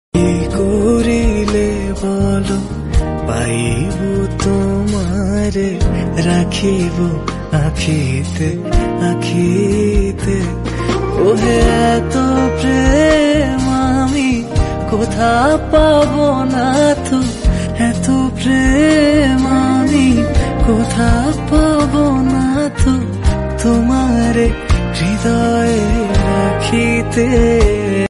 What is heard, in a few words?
bengali song